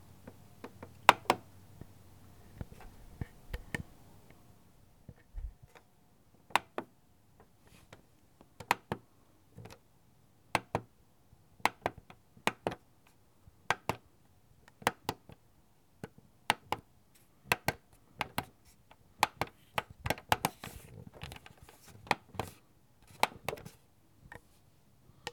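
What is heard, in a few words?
Buttons Click Plastic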